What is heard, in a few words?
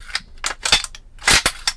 Uzi Metal Mvmt Cocks